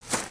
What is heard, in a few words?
Footstep; Lego; Strombie; creatures organic